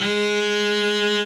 cello

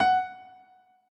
piano

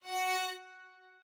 strings

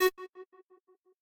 synth

